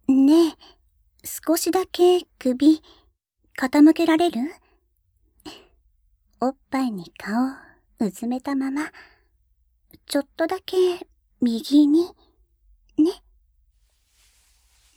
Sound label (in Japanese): ノアの耳舐め, ASMR